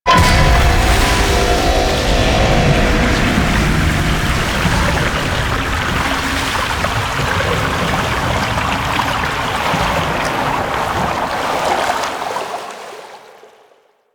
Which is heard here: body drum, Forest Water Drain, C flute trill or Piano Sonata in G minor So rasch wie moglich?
Forest Water Drain